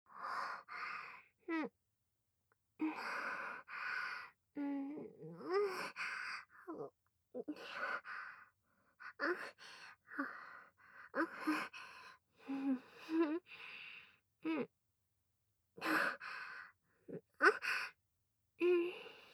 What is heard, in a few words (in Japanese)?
喘ぎ声・普通